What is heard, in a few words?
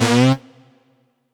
Dance Mega Synth; Best Service; 90 s Sample CDs